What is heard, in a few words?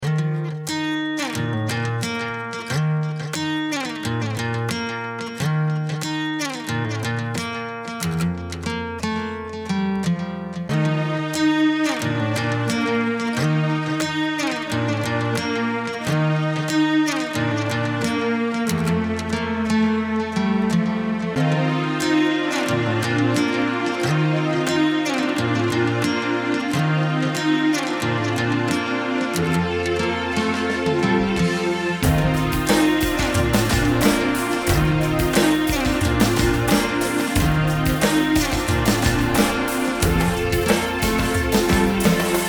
grunge